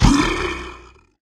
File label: synthwave